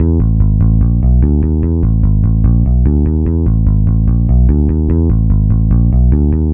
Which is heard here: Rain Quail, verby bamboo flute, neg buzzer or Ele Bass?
Ele Bass